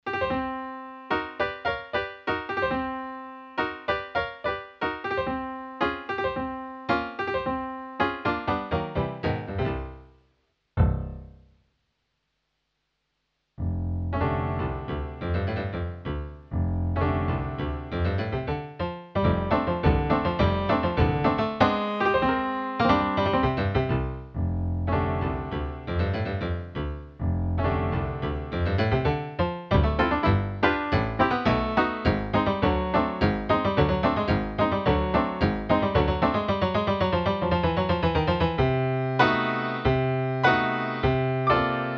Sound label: V-Piano